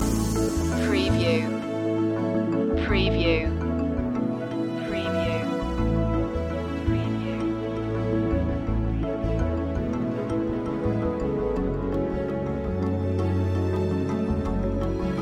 paradise breeze loop

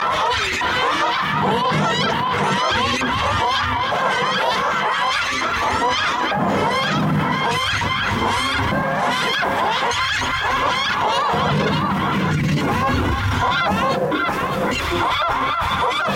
nightmare voices

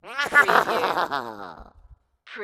Evil Laugh

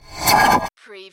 Button Sound - Metal Tin Pull

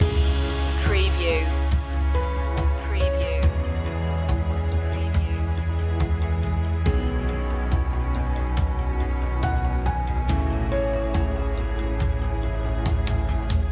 epic strings loop

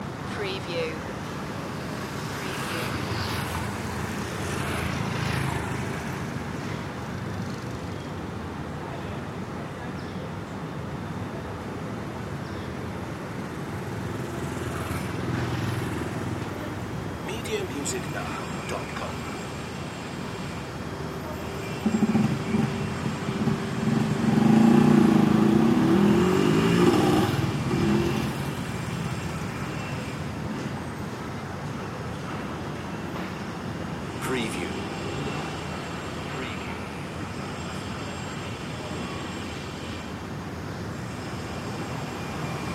city side street ambience loop

